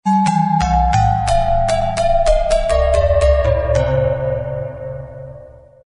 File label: mysterious descending bell, fantasy